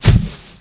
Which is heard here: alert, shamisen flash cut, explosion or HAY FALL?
HAY FALL